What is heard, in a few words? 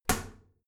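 toilet lid wall open heavy, bathroom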